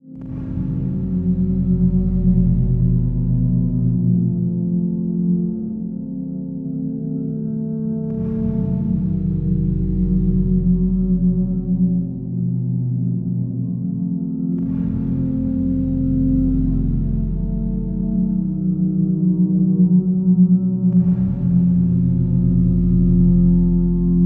Cabin Eerie Evolving Ha, Cabin eerie evolving harmonic pitches